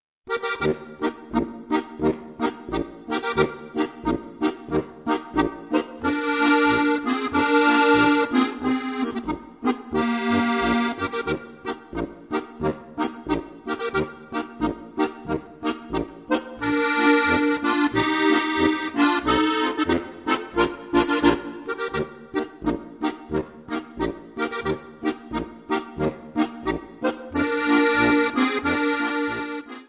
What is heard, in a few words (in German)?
polka